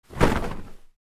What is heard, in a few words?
Karate gi fight movements